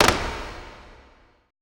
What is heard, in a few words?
E STEPS, ETH PERC